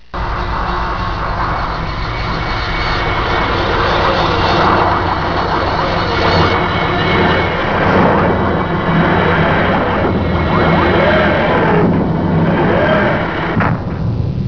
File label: time travel